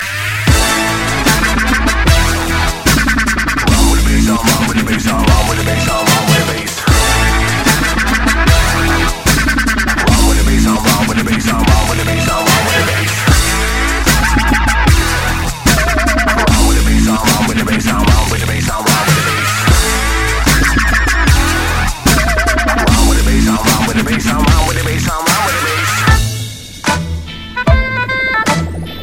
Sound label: dance